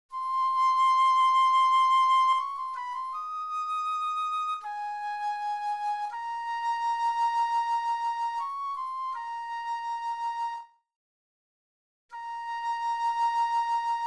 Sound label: Flute